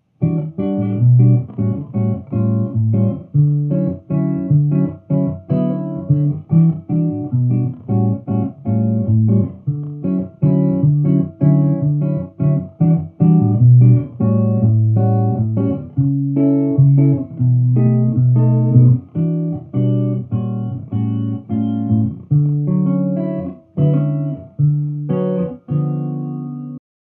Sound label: Jazz after